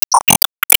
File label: Bonus Balloon; Se Sys Bonus Balloon 2